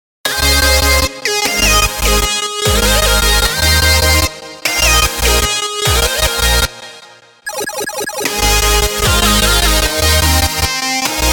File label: with sidechain